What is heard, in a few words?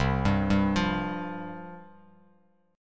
C Suspended 4th C, Bass Standard; Csus4 arpeggiated; 8,10,5,10; JGuitar